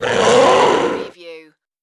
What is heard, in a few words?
creature vocal